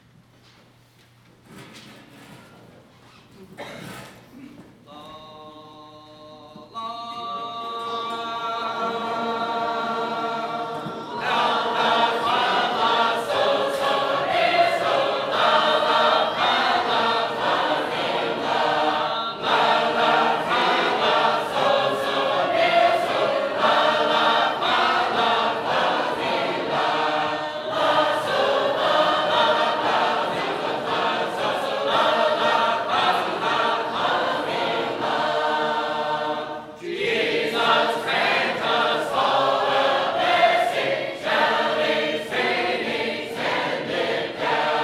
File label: Shouting Song, Sacred Harp